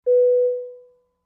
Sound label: Aircraft Bell Announ, warning signals